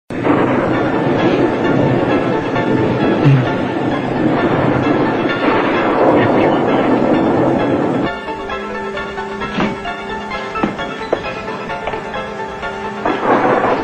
dramatic theme

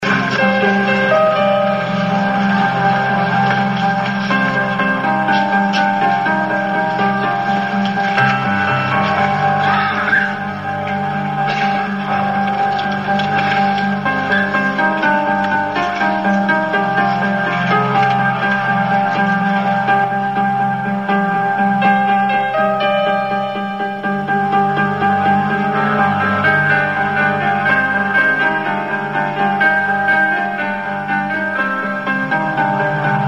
dramatic theme uptempo piano

